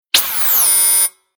Future Telemetry Static Button Interface Buzz Space Scifi; Tom Hutchings